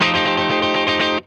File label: F JANGLE, Funk Guitar; Zero G - Funk Guitar